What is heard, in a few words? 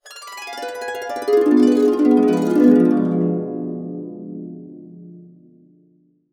D DIM7 CA DN, CASCADE DN, Complete Harps; USB Soundscan vol.62; AKAI